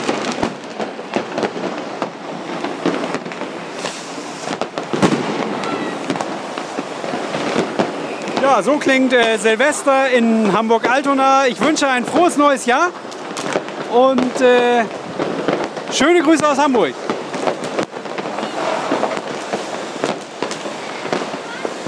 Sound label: silvester in altona